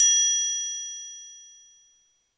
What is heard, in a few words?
ride cymbal f sharp key